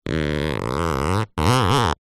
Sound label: plastic rub squeak with hand; zapsplat; foley